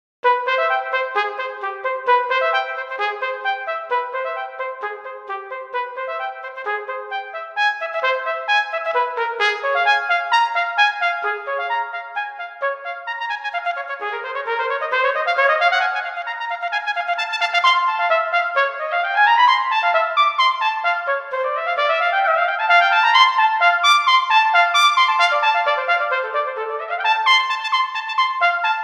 Cornet solo

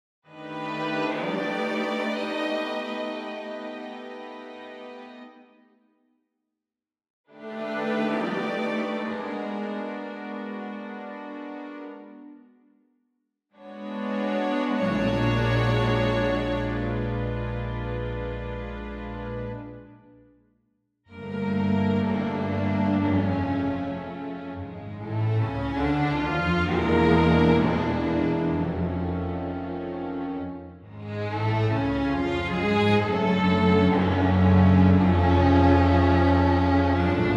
That Old String Sound

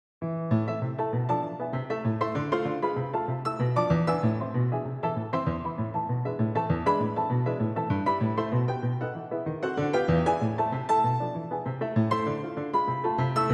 Etude in A minor

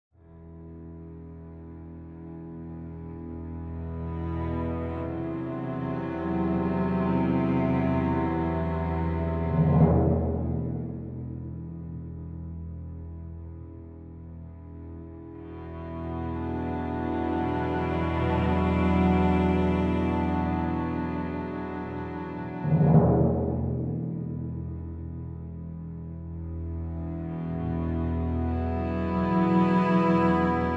Suspense

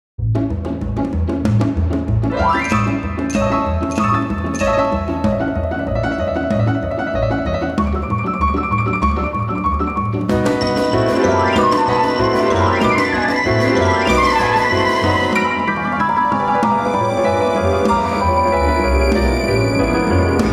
Romanian Variation

